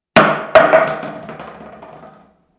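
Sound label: falling sound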